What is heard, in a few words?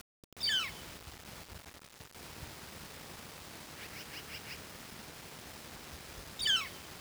flicker, eastern washington